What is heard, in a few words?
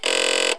Grunt Grind; activegs ios